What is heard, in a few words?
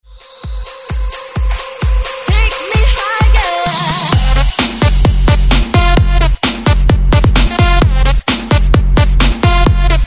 130 BREAKMIX; DJDOLLS